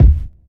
round kick sound d key, kick drum sound tuned to the D note. Loudest frequency: 94Hz